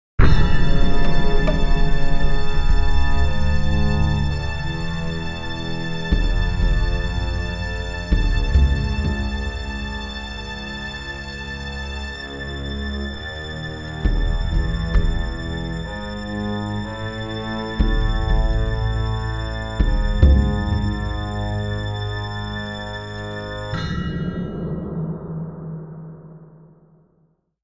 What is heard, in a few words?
Orchestra Strike